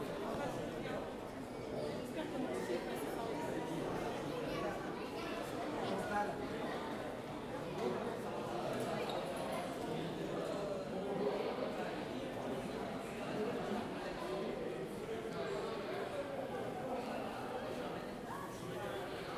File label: hall exit, CROWD AMBIANCE; Chupapsound